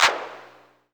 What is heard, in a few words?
CLAPS HOU; Best Service Dance Mega Drums